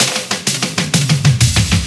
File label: Fills 128BPM, Clubsounds